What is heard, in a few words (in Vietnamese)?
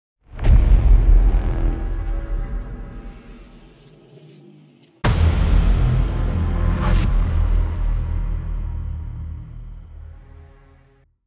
Nhac nen mo dau phim quang cao hap dan